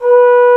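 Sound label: Trombone mf B2; Digital Sound Factory Virtuoso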